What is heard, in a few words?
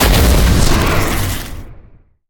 arcane; smite; god merlin